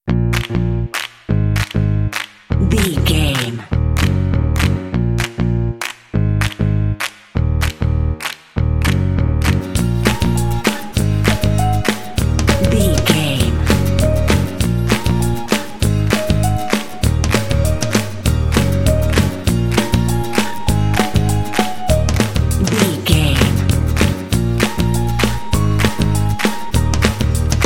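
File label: happy indie claps cutie original mix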